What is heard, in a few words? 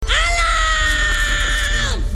alarm